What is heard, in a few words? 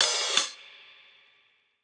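open hi-hat; eddyflux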